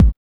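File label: HSE-BD, house bass drum; X-Static Goldmine 1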